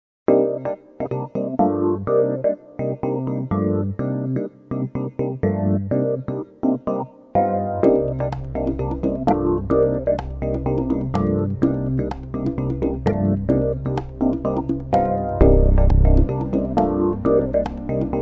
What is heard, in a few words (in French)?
l'accordeon